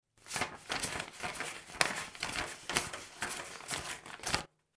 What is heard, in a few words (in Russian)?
zvuk deneg